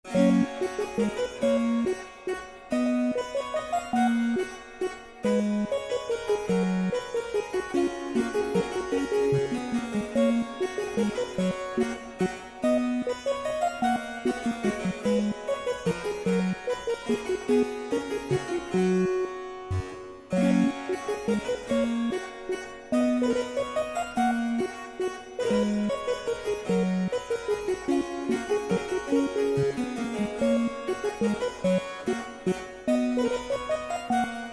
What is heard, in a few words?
Sync Sine Soni